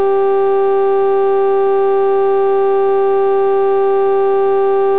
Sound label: noise